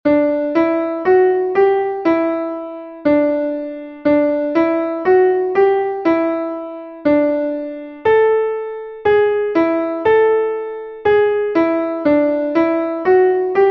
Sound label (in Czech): melodie; Vynášení Mařeny